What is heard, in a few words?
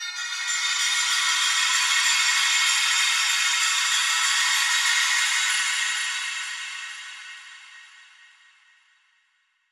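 Synthetic Cyberpunk Ambience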